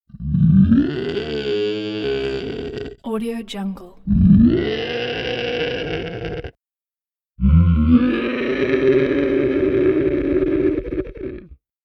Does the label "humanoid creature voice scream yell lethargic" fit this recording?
Yes